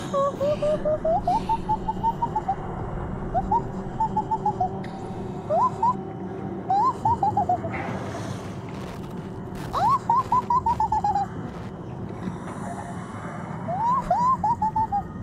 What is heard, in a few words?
ouhouhouhouh; noises